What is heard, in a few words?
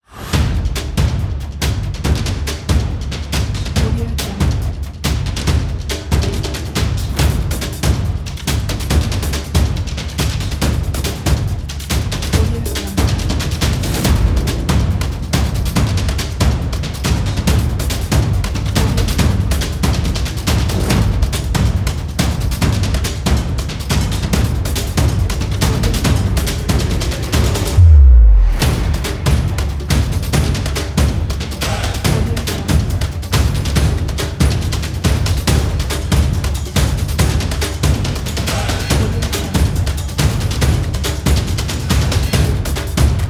intense drums